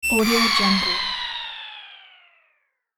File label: science fiction power drop; sonic-boom